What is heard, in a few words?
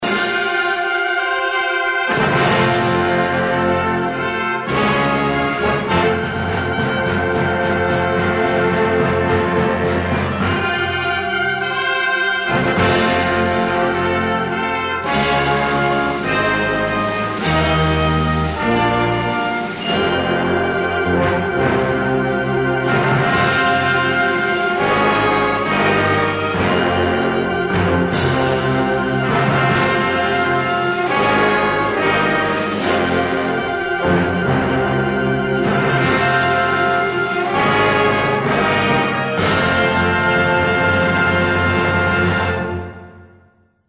Nordic Fanfare and Hymn